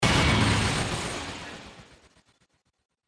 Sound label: Burst Reverb